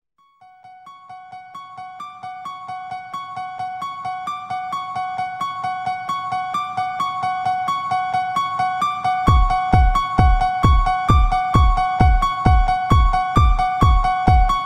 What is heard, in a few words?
techno Halloween theme